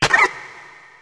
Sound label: pain; alienarena; martianenforcer